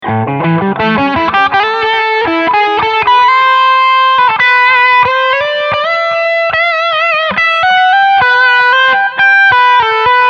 Leadsound pushed Mids

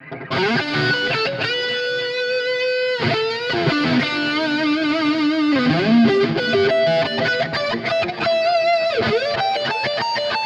Highgain Lead; Gitarren-Plug-ins im Vergleich; Genome

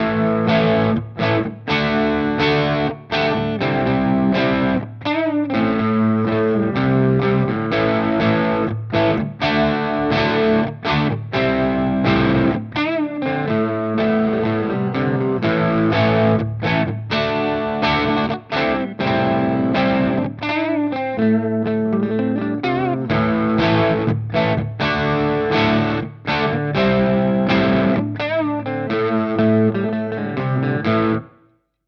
Epiphone Prophecy Les Paul Clean Both Vintage

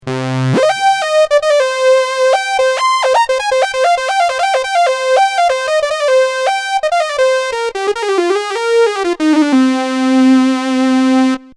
UDO Super 6 Mini Lead

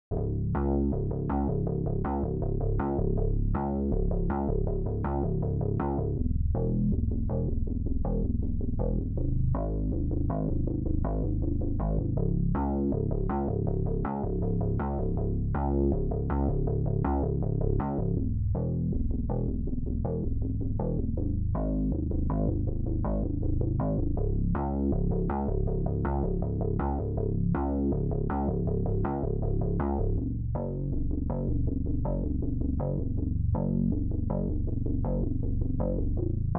Bass Guitar no FX Normal; TB Lowtone; no Lim 13dBFS

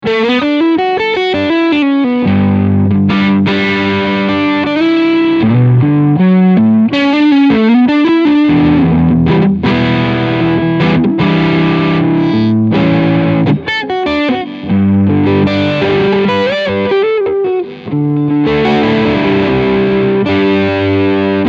Overdrive Neck PU

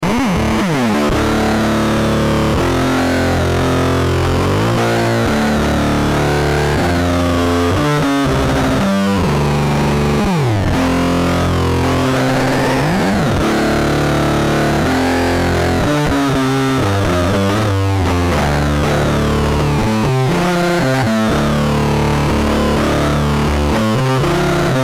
Swarm Fuzz Pedal; Beetronics

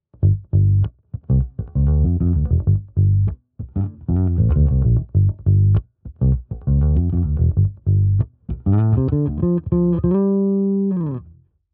Fingerstyle USB

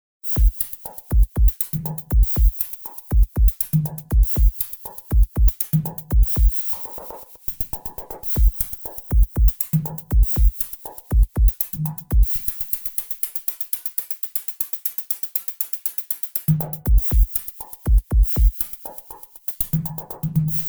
Rounds sequenz

